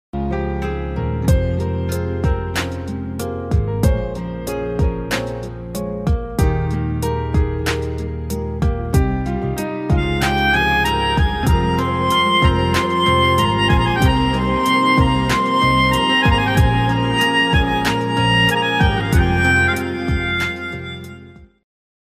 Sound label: piano romantic ringtone; aashiqi2